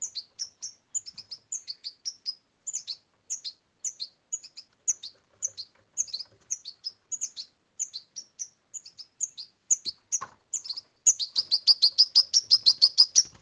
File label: verso Juv cardellino carduelis goldfinch; stieglitz jilguero; bioacustica